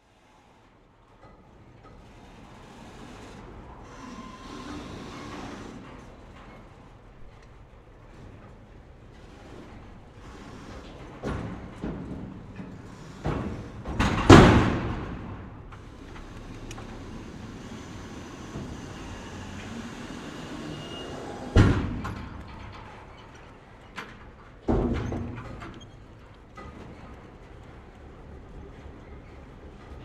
Dock Ambience Cranes, Chains, Loading, Iron, Cargo Ship; Dock Ambiences and SFX